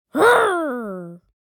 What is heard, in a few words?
Young boy playful grunt